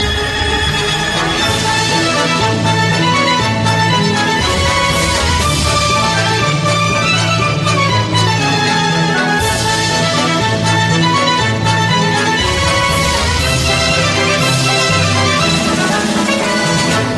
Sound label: classic tone